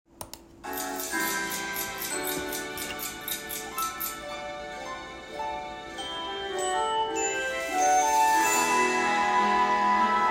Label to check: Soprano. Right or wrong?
Right